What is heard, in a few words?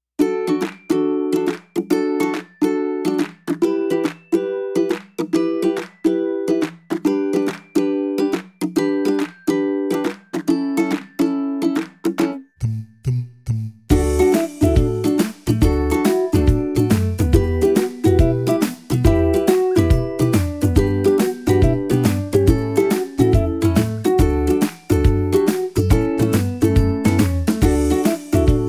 happy ukulele music funny cute sweet fun background intro theme